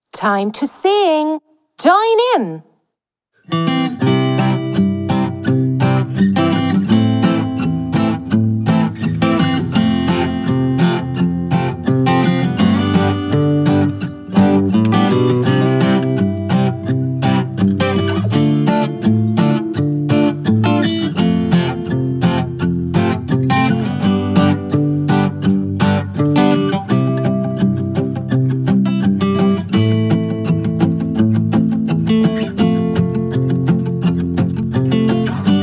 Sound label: i like fruit song karaoke; ich und du; Schuelerzeitschriften